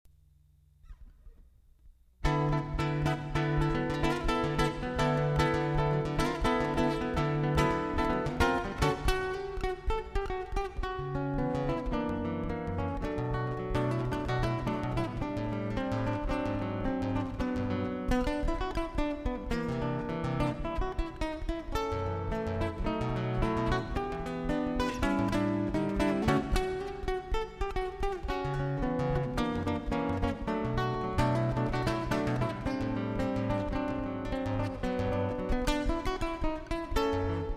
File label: Brazilian Jazz Samba